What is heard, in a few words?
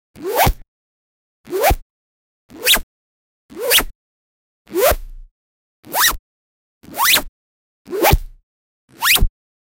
logo reveal; 6 seconde